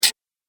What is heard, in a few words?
Droid Up Periscope, robot sound effects; Andrew Fleming; Twistedtracks